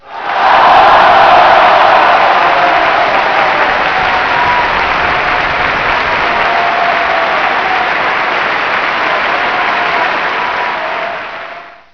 stadium; f1map

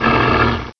gonome pain; mystic radar; mr zombie1

